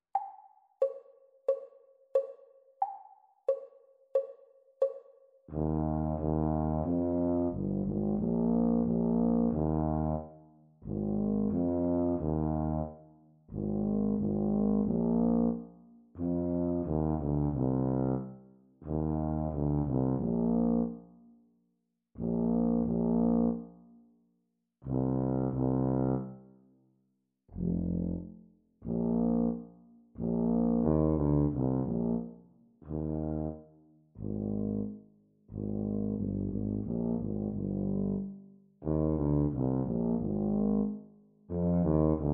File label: Bb tuba duet sea song exam speed; Grade 3